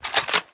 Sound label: bolt up, weapons; cstrike